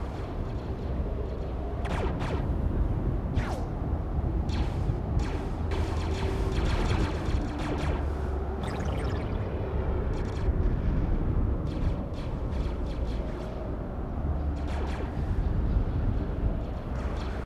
ambience; Jedi Battle; Lego Star Wars (PC)

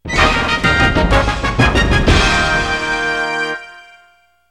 Stage Clear; Super Smash Brothers

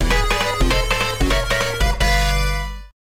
Hurry Up!; Paper Mario - The Thousand-Year Door (GC DSP); Bowser Level

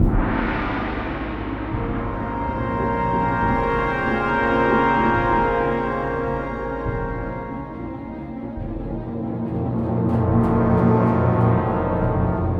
Instrumental Version; Star Wars Republic Commando (PC)

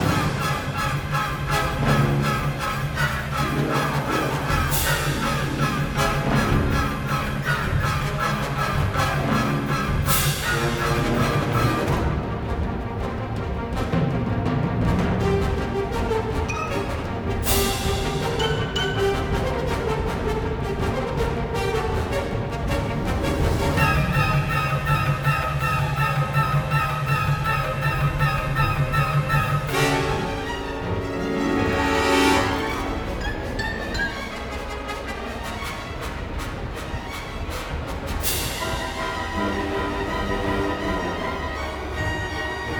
Action; Gunship Cavalry; Lego Star Wars (PC)

